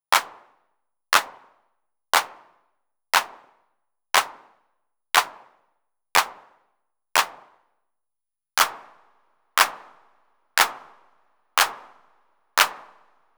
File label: RD 9 vs TR 909 HANDCLAP